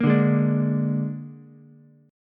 Ab Suspended 2nd Flat 5th Ab down Ukulele; strummed; JGuitar

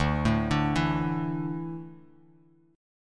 D Suspended 2nd D down Bass Standard; arpeggiated

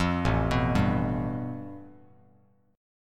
A 7th Suspended 2nd Sharp 5th, Bass Standard; A7sus2#5 arpeggiated; JGuitar

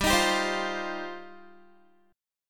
G 7th Suspended 4th Sharp 5th, Guitar

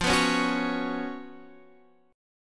B Suspended 2nd, F, chord; strummed

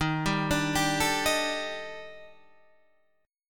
D sharp Major 7th Flat 5th, down, Guitar, DADGAD

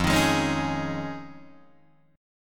F Minor 7th Sharp 5th, Guitar, DADGAD

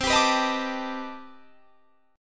C Minor Major 13th C down Guitar Standard; CmM13 strummed; JGuitar

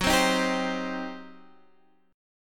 G Major 7th Suspended 4th Sharp 5th, F sharp

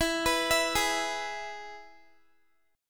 E-Major E, Guitar; arpeggiated; x,19,21,21,20,x

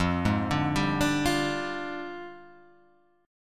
F Minor 6th Add 9th, Guitar, Open G

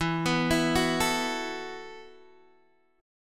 E Suspended 2nd Suspended 4th, Guitar, Open G; down

